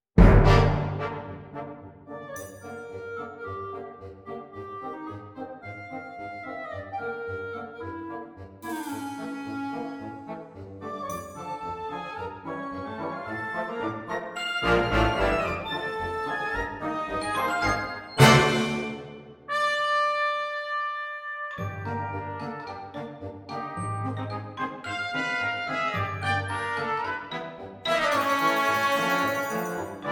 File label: Little Polka Band